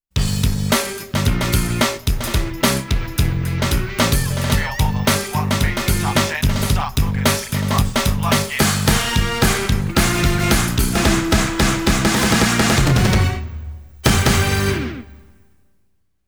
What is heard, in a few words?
Title BGM; BEAT BOMB